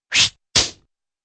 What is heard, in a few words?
light swish and smack